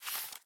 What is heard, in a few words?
Paper Move; Game rip; MiSide